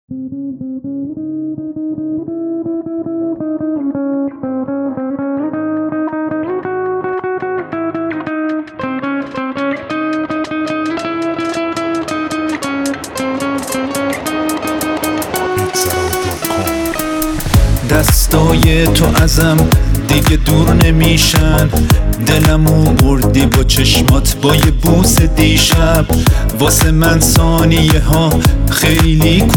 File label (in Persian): ماکت 6و8